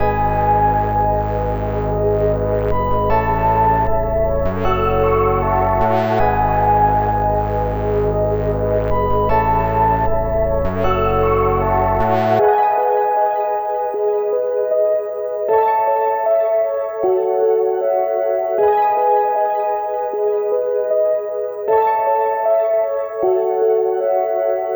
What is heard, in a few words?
155 BPM G# Min, Synth; ARP+JU60+JUP8+MM; Loops; Purple; Cymatics - Fantasy Synth Sample Pack